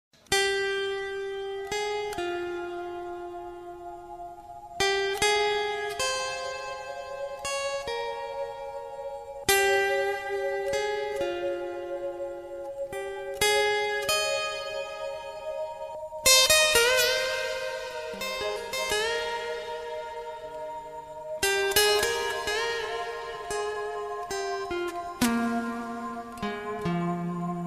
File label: instrumental ringtone